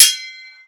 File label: sword